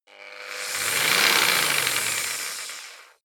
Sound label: jigsaw engine medium slow short; maschinen; saege